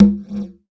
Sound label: VEE Percussion; Percussion Instruments